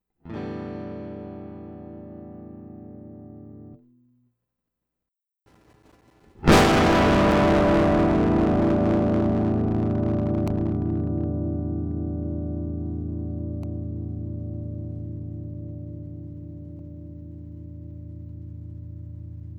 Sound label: Guitar Distortion